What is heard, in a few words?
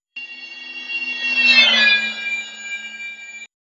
passby pressure total